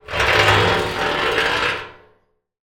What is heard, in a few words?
metal pole scrape on empty metal oil drum; zapsplat